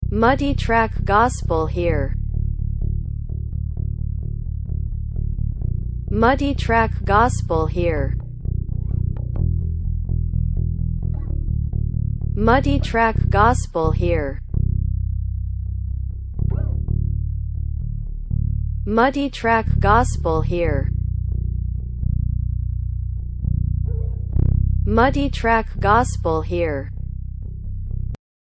BASS

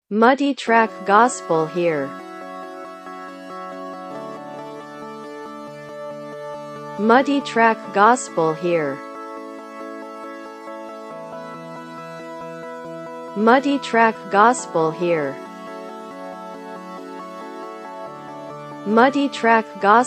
keys synth

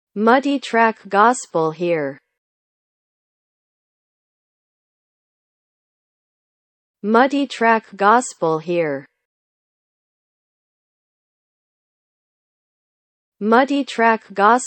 LEAD

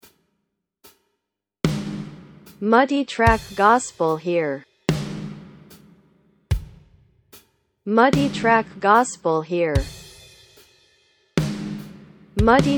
Drums

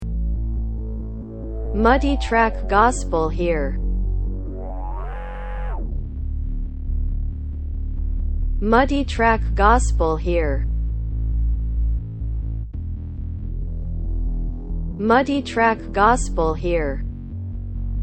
BASS SYNTH

